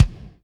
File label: Kik Abrupt, Drum Machines Alesis D4